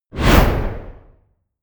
whoosh

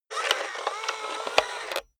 robot movement slow

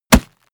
punch mid tight

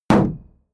shield sheet impact dent

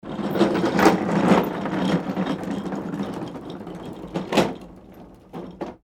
bottle case sack cart

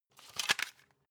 cd cover close soft

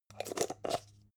jar plastic midsize close

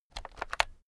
keyboard external shortcut medium

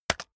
keyboard external space hit very fast

